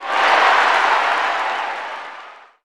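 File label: Basketball Crowd Cheer; SFX Kit; Crowds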